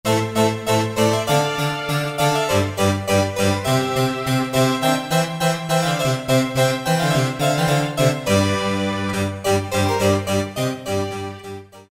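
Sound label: Baroque Ensemble, Roland SR-JV80-07 Super Sound Set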